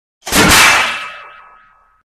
Metal Impact With Warble Impact With Distorted Warble; Hollywood Edge Foley Sound Library